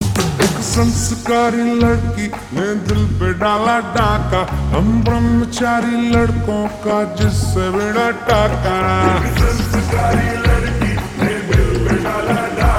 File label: Ringtone Slowed Reverb